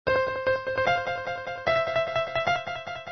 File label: melody